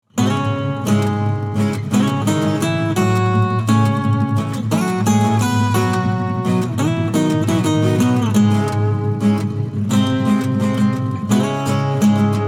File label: Acoustic; Touhou 14